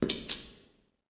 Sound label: Dungeon; kult